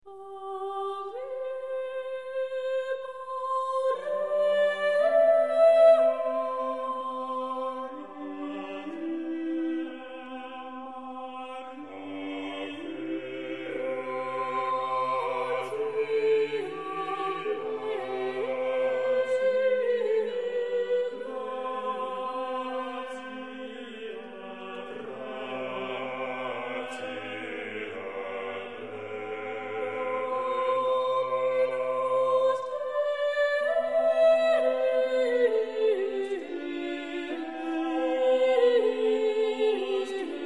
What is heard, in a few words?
Motets - Ave Maria (4 voices); Josquin des Pres